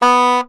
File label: Soprano Sax Portato B2; Orchestral; DirectWave; Varazdin Orchestral